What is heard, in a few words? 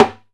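lo timbale muted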